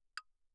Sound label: clave, hand percussion; claves